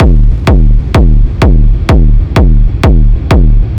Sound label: industrial kick; drum loops